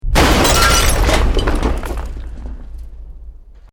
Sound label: EXPLOSION MEDIUM BRICK WOOD GLASS NO FIRE